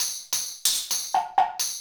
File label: Tambourine and Wood Block; Combo